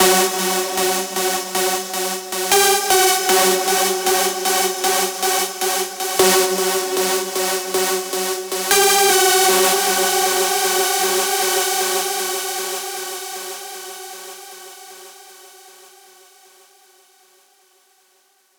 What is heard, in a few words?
screech loop F# min 155; Music Loops; GhostHack